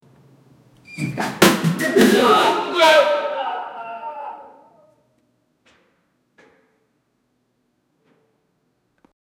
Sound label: man throwing up in room